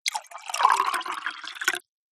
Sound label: food drink water pour into glass